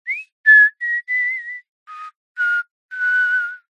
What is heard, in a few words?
human male whistle short tune riff